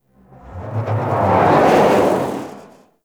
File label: Teralyst Whoosh Step; Enemies; Steps; Warframe; Eidolon